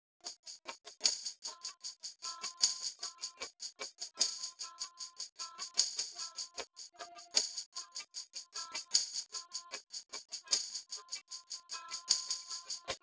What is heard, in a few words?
percussive